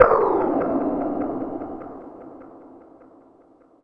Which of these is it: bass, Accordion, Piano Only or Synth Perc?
Synth Perc